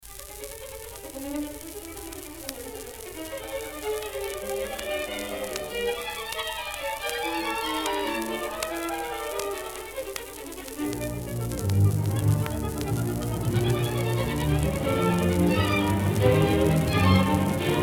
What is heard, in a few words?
Elgar Unfiltered